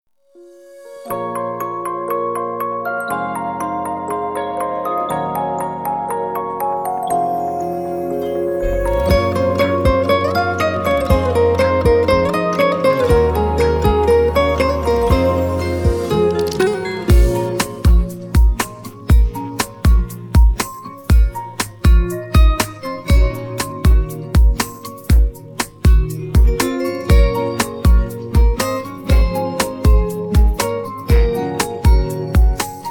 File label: instrumental, persian